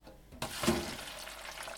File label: sizzle; night market